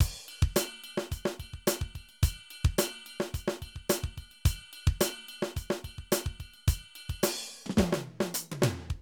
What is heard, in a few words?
Drum loops, 70s funk; Zero-G Groove Construction (1993)